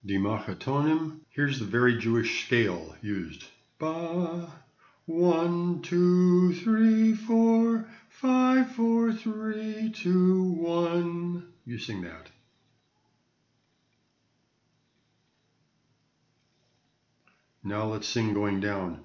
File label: Makhutonim Geyen Basses, chorus